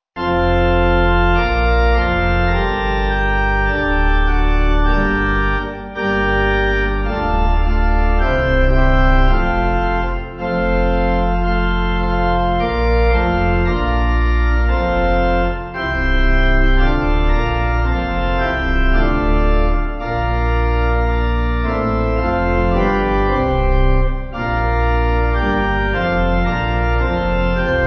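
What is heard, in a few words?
Pipe Organ, Music Backing Files for Churches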